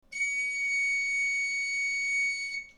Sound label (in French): ré, galoubet; Note Ré - 4ème ligne